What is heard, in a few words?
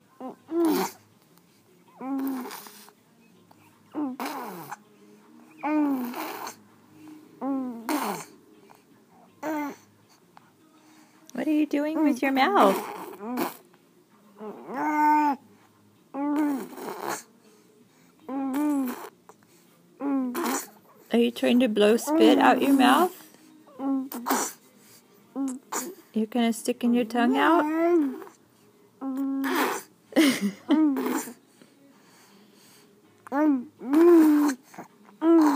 spit blowing